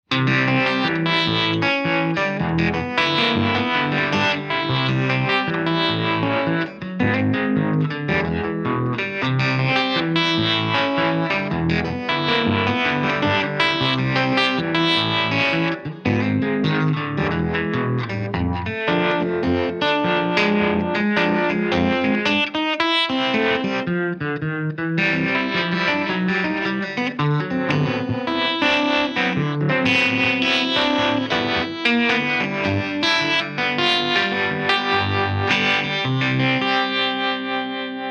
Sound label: Harmonic Tremolo pushes Fairfax in and out of Class A saturation.; Flint; Broken Vibro Scamp